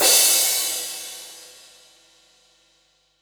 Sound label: Crash, Cymbals; Minimal House